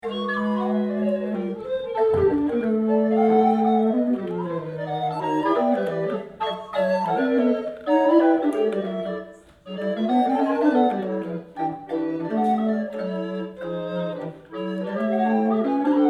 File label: bees